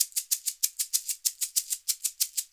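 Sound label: shaker egg low, percussion; ILIO; Synclavier Percussion 2; World & Orchestral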